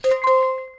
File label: klung